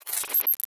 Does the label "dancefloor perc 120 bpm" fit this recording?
No